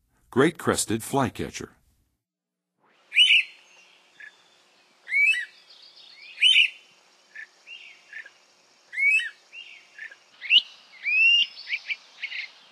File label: Great-crested Flycatcher, Songs of Birds of North America; Western USA; Western USA Songbirds